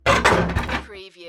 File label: Heavy Metal Concrete Stress Breaking